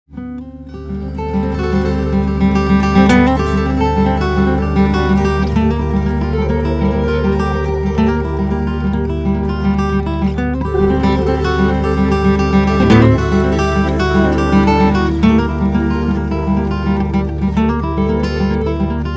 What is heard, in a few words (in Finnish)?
kitara